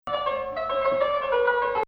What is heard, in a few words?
Ajam; 2nd Ajam 8-11